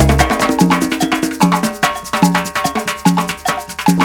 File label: CARIBE LOOP; Crypton - DrumBase5000